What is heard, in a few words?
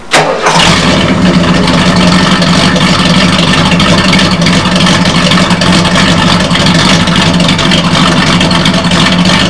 engine, Torino; Fastcars; 68 Torino